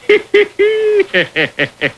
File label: he he heeee